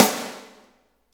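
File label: SNARE PICCOLO; Roland L-CDX-01; Snares 2; Sn Modules 2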